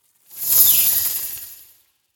bats; smite; scylla

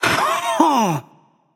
nv sigh; smite; xbalanque

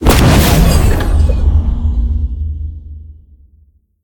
projectile; smite; olorun

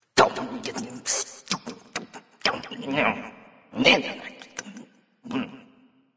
grumbling; smite; ahpuch

